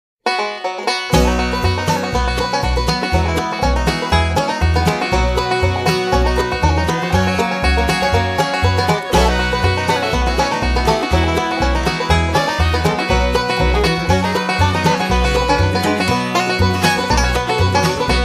banjo

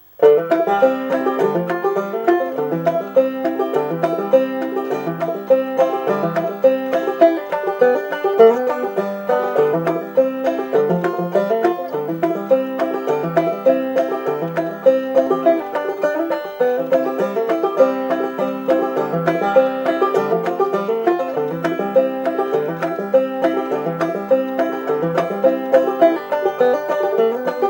drop thumb, banjo

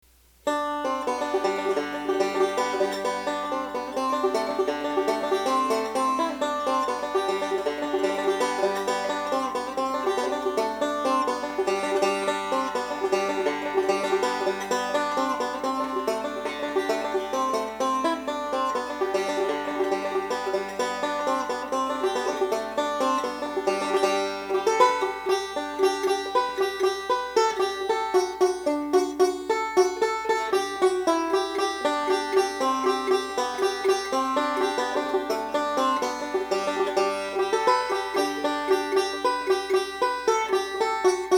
irish wash, banjo

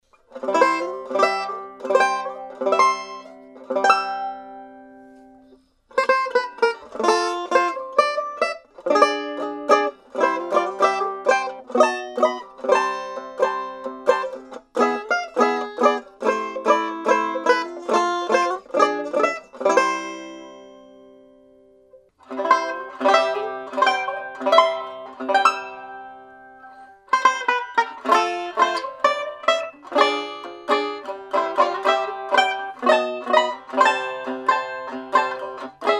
epiphone, banjo

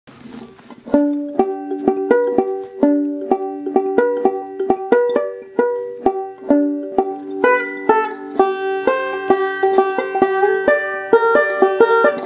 bugle call

